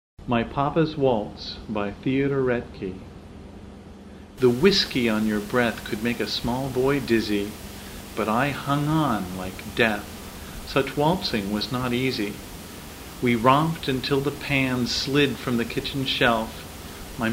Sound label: waltz